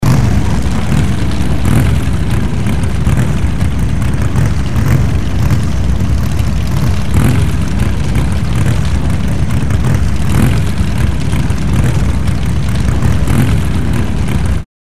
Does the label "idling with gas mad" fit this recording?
Yes